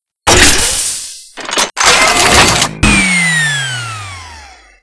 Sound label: Reload, weapons